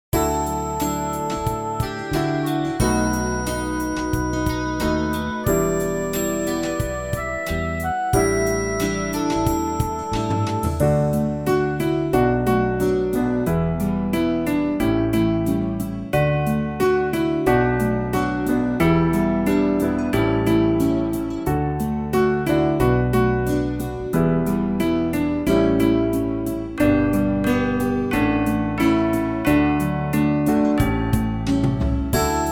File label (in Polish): kiedyś instr